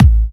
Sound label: Bassdrums; Vengeance Samples